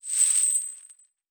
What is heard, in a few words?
Chain; Fantasy Sounds Bundle; Craft